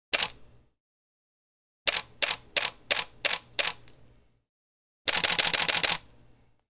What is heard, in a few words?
Dslr Shutters